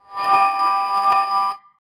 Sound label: deck full rejection sound, negative feedback